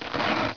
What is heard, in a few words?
velcro; 12000 Graphics Pack - A Complete Graphics Collection For Your PC